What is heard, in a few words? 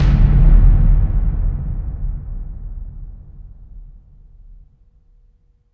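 CAVERN, AMBIENT DRUMS; Spectrasonic Distorted Reality 1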